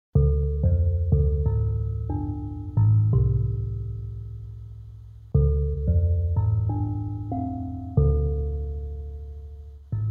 mallet K

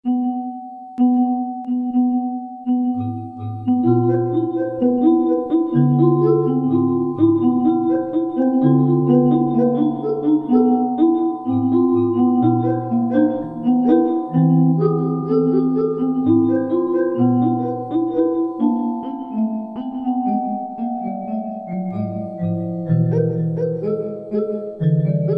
Squeegy

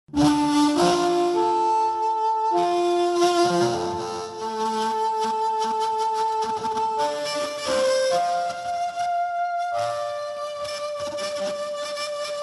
Speaker Driver

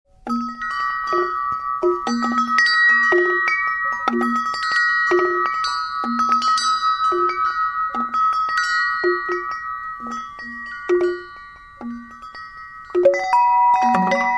chord chimes

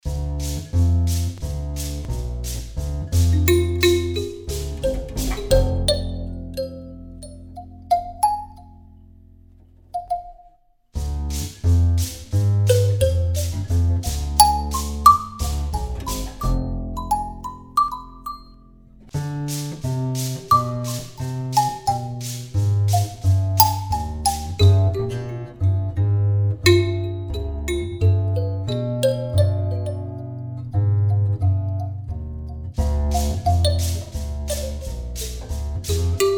Airy air

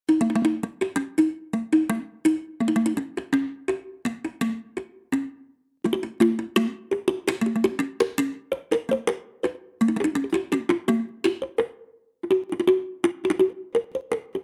bootoos

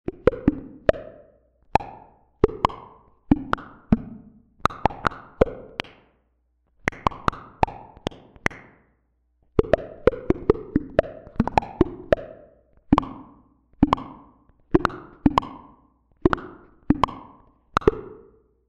piezo tongues

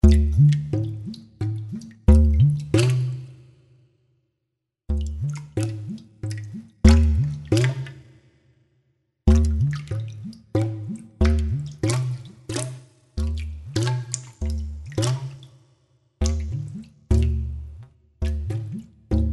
Slap Tubes Water Dip

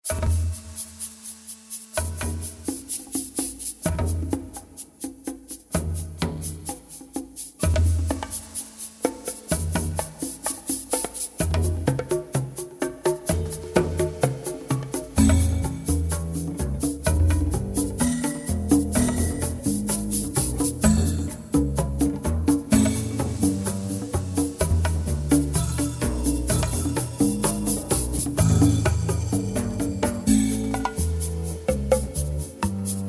misc drums

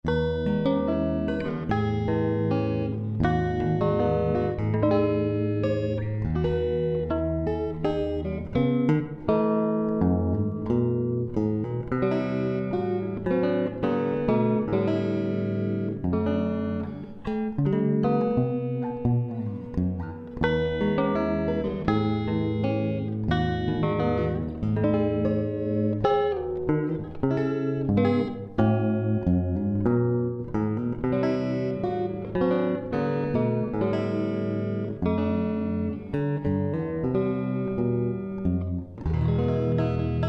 Y string guit

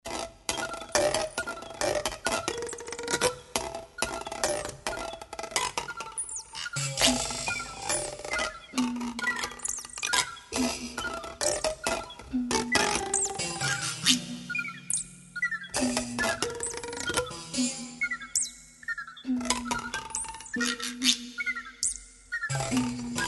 scraper flute

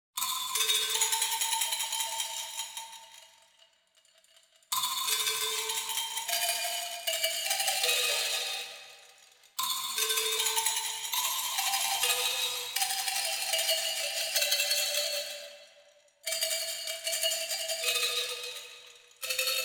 dino shaker arms

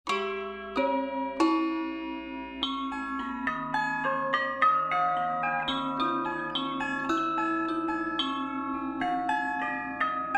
weighted str

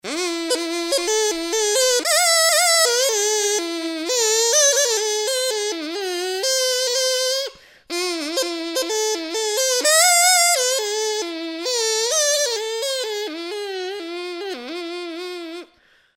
Soda straw

